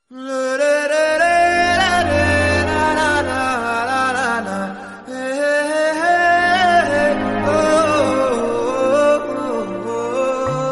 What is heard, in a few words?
background music bollywood tone ringtone